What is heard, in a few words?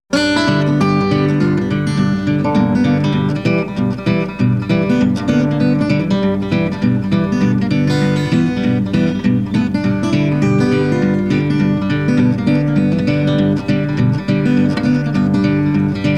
Blues